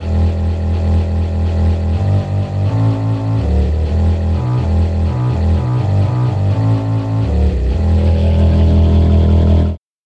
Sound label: fiddle bass